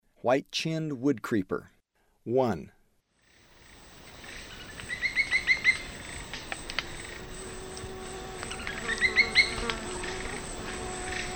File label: White-chinned Woodcreeper; S. America; Eastern Lowlands